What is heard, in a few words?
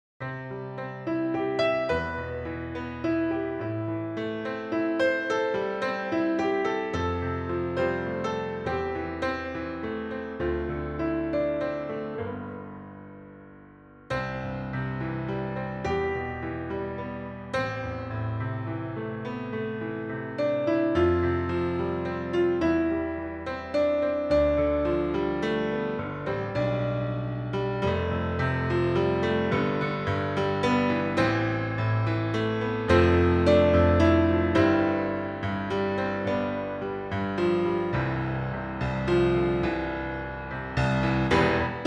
Piano Version